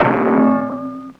anvil; Crash Bang Boom

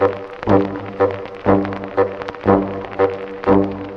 horns crackling oboes

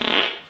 fart short

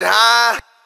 "ah", Voice

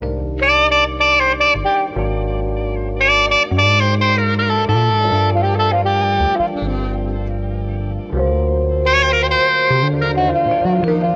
sax and keys, loops, phonky

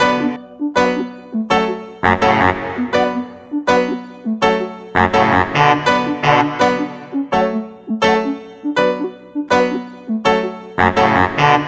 piano and sax ragga, loops, phonky

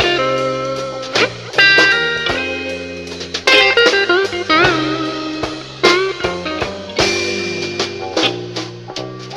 guitar, Loops, Phonky

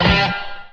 guitared

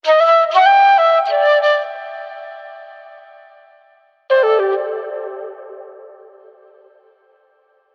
flute, Loops

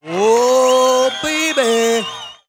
woah baby SUNG; ooh ahh

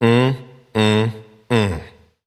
mmm hmm mmm; ooh ahh

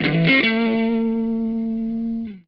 bluesy

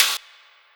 Hats Electro Open

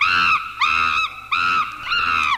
chimpanzee; Animals

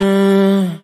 mmm, Voice

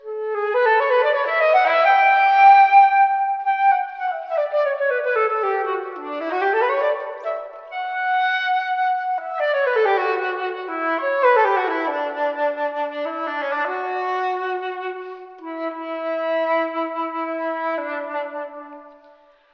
flute, Loops Phonky

